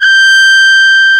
FR HRN5

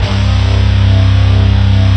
HEAVY DUT, JV-1080; Syntec; Wall of Sounds

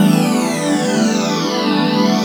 Pad Sizzle, Keyboards

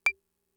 Clave, Fricke MFB-522

